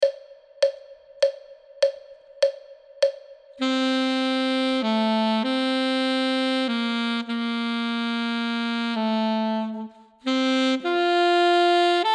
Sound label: duet part 1; Ten Easy Duets